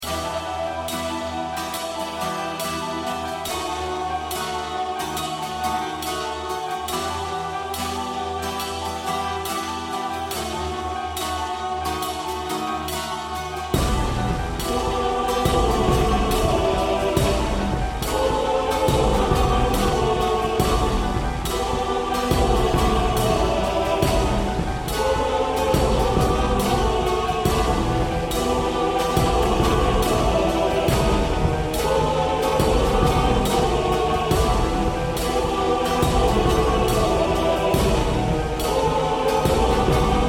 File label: bgm zone village; zone4; goken